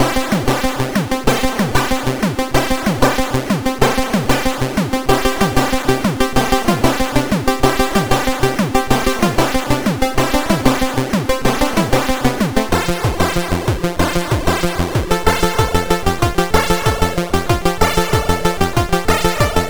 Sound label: Small Song, sid CSG8580R5